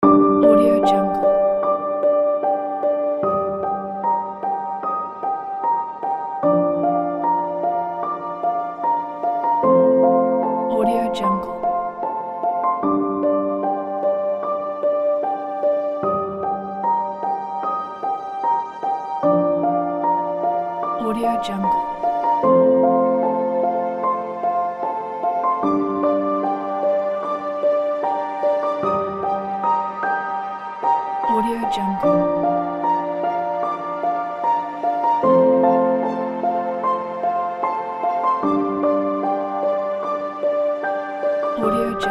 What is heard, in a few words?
documentary piano